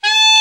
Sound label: Alto Sax; Sound & Vision - Gigapack II CD 1